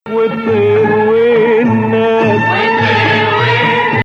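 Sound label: Nawa Hijazkar, Arabic music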